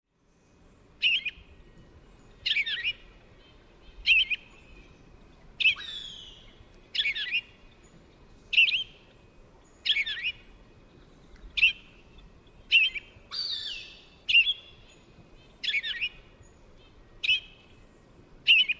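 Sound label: Purple Finch vireo song alarm; bird language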